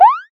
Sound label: hop